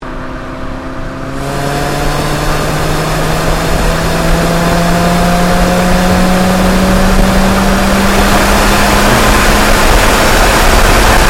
Car Ford Focus Accel Gear Change Exhaust Wind Noise; Ford Focus hire car; Cars; Vehicles